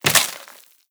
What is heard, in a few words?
debris wood, bullet impact debris